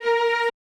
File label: QVIB F#A3, Orchestra; AKAI Advance Orchestra Upgrade 97 Vol.1